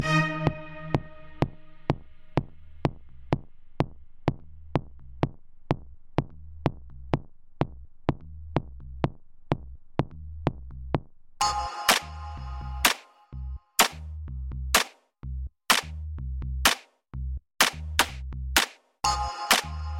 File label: Bass Sms